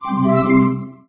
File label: invite allow; There; ThereClient